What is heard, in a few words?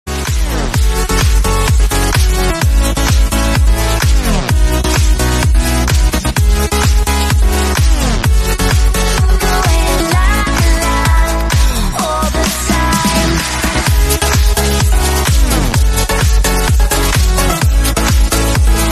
remix